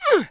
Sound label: jump